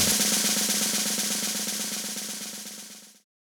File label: HipHop Snare Roll; Pro Tools Essential Loops; Digidesign